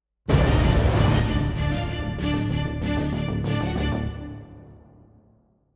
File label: 190bpm; Legends of Pegasus; windows gamerip 2012